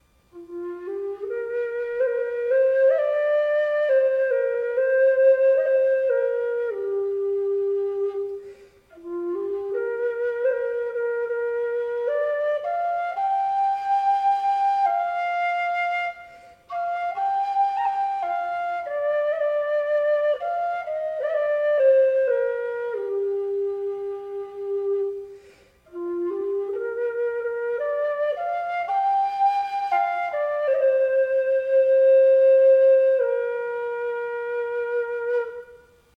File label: low f whistle; twz sonora